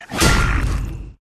Rat block

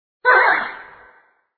black widow wound

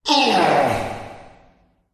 mage boss wound

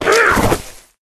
Pet Troll Die

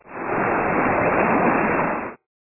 magic water

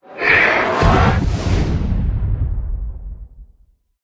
worm death

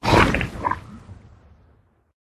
worm wound

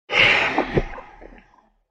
die

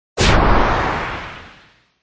dragon boss

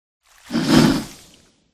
zombie buff out

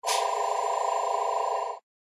Light Spirit Stun

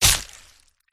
boss attack contact middle; krofdor

